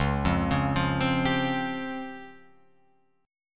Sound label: Db Major 7th Flat 5th Db down Guitar Open F; DbM7b5; arpeggiated